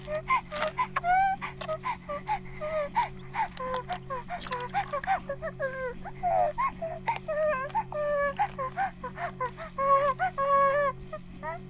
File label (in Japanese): バイブ放置 おしっこ; イクたびにわけて